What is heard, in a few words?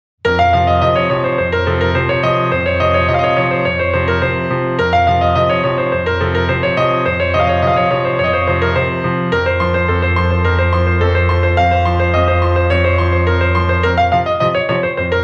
For Female